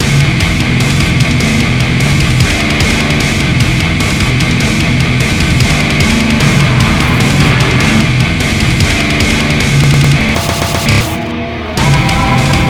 loading loop, music; svencoop; morass beta

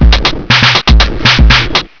drums; svencoop

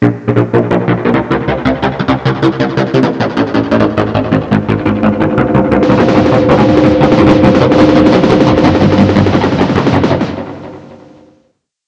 sewer systematic music

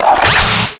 switch to shock rifle

